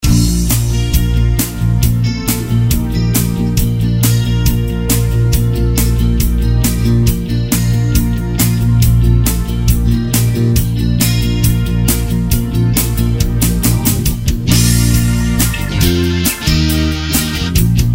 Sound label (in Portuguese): rock nacional medley